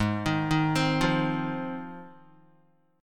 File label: G sharp 6th G sharp down Guitar, chord; G#6; arpeggiated